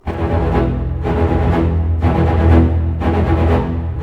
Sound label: SNAR, Snare Army; East West - Scoring Tools